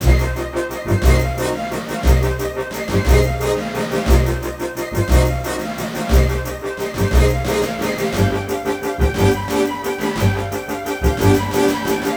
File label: Gyrocopter